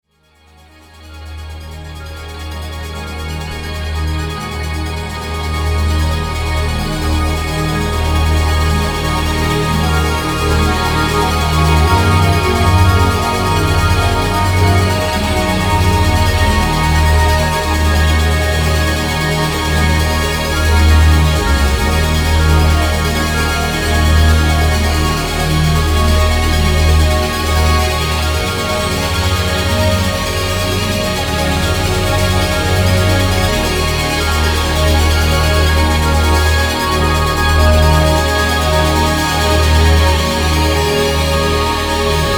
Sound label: rhythm and noise